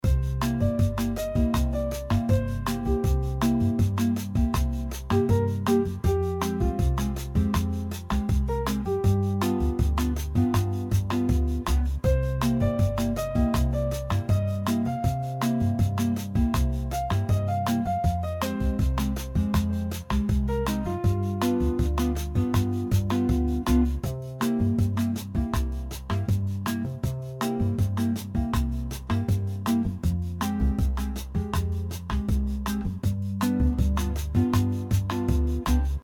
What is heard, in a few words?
background music